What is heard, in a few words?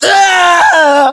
death